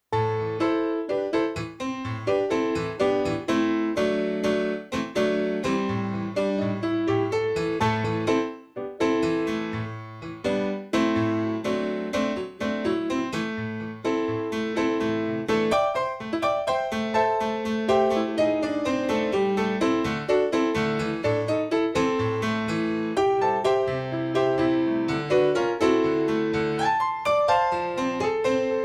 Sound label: Piano French Quarter 120